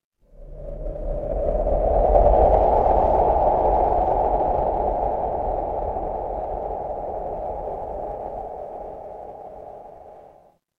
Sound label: horror wind whisper